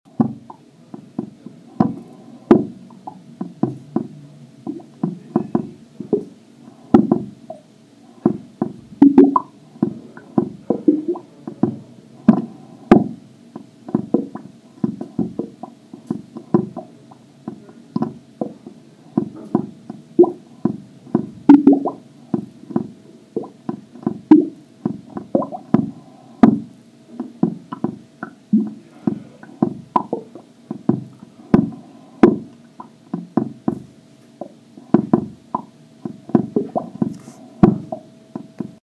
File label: Singing Sink